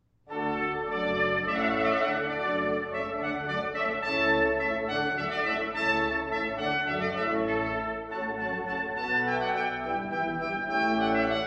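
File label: hornpipe from water music trumpet and organ; weddings; handel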